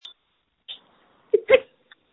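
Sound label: screams that feature laughter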